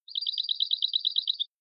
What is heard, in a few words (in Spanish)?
cascabel